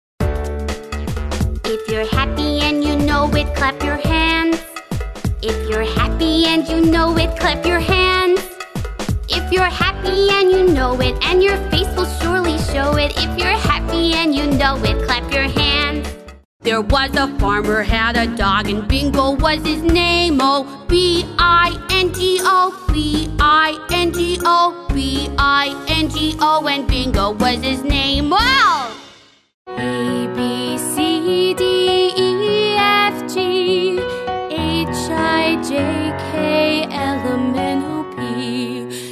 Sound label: USA Songs